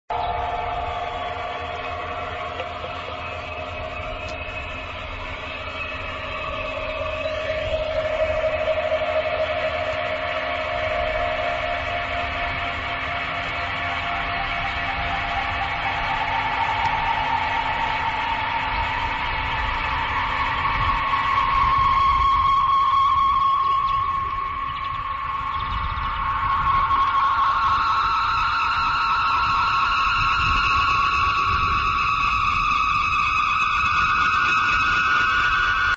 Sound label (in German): sirrgeraeusche